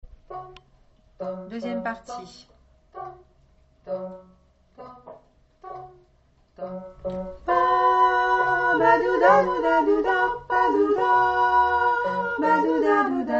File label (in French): contre chant Alto et sop